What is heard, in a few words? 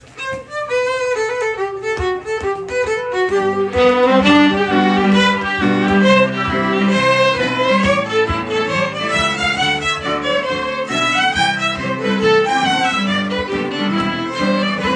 Jig, Orchestra Recordings